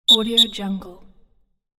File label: football whistle